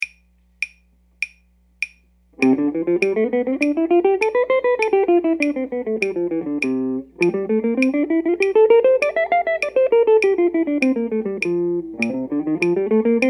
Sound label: major scales 100